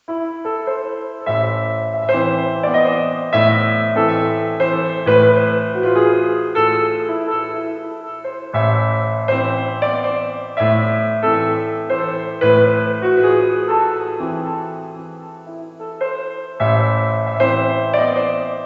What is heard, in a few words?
Mazurek; Chopin